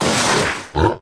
power

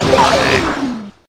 bomb; Robotbus

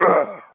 Scream

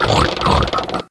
skill fire

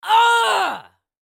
Scream

